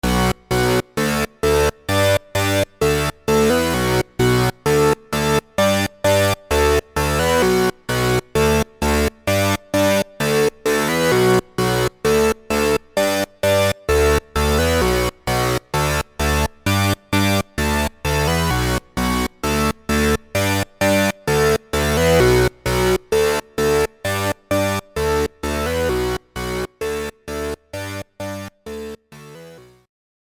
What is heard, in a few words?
Bass; LAMM RawArtistic PhilKullmann